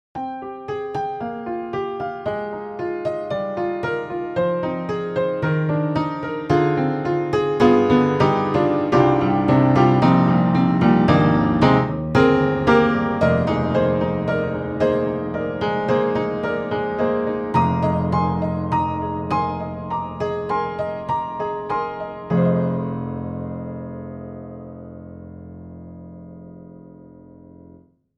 Upright Pianos Vintage Upright Sweet Vintage Upright; Synthogy; Ivory II